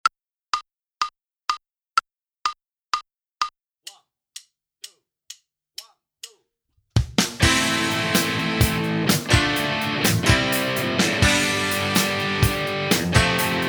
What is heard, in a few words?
Minus Bass; Inst Ensemble